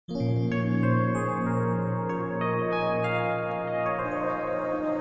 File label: love sms tone ringtone